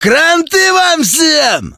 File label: attack; metrocraft; customnpcs